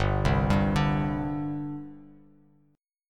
G Suspended 4th G, Bass Standard; Gsus4 arpeggiated; JGuitar

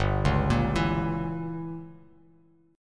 G Diminished 7th down Bass Standard; arpeggiated; JGuitar

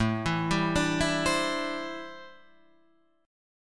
C 6th Add 9th A

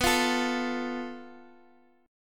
F Major Flat 5th B

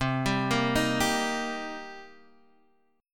C Minor 7th, Guitar, Half Step Down

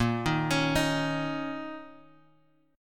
A sharp Minor Double Flat 5th; A#mbb5 Chord {6 6 X 6 4 X} arpeggiated

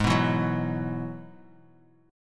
Ab Minor 7th Sharp 5th Ab, down, Bass Standard; strummed; JGuitar

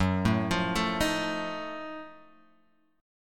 F sharp Minor Major 13th, Guitar, Open G

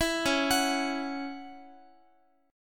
C sharp Minor Double Flat 5th, Guitar; arpeggiated; 4A,4E,5A,5D,5G#,6C#

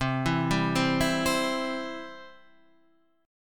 C Major, C, down, Guitar, Half Step Down

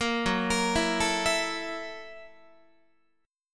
F sharp 7th Sharp 9th F sharp down Guitar; arpeggiated; F#7#9; 13,14,13,14,13,15; 4A,4E,5A,5D,5G#,6C#

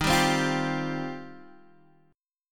D sharp 6th Add 9th, Guitar, Open D

